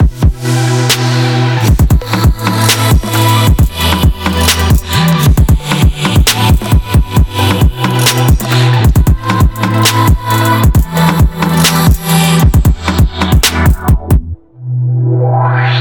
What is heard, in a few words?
TRAP LOOP bpm134